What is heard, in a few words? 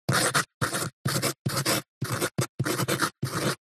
Handwriting Fountain Pen Desk Medium; D&H; soundbox library